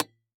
Impact PVC Pipe Hit By Metal Sword

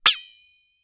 Impact Metal Spatula Hits; Impacts

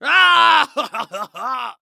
Human Pirate Laugh

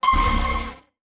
Multimedia Internet CD-Rom Flash Short

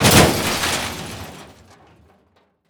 Crash Car Metal Debris; Crashes

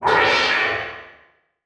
Transition Whoosh Alarm

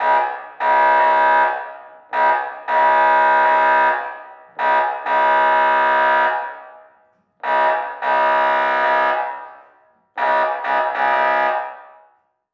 Emergency Patrol Boat Air Horn Docked; emergency effects; AdobeCC

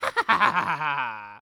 Cartoon Human Male Evil Laugh

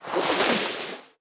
Snow Ski Sliding Over Snow; Sports

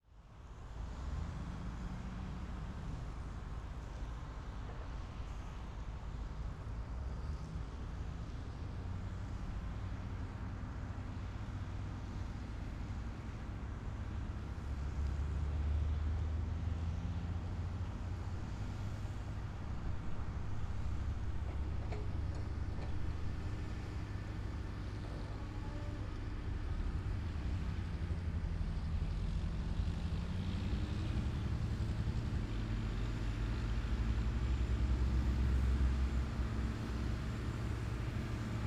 Industry Ambience Construction Site

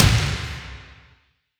HITS, Cinematic Sound Effects

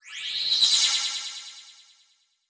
Transition Delay Medium; Adobe; Production Element

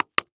Foley Footstep High Heels Jump On Cement; Foley Footsteps

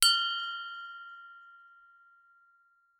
Bell Brass Handbell Ring; Foley

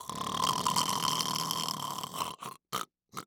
Cartoon Human Male Snoring Pig Snoring

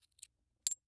Coins Movement Counting Single; Foley

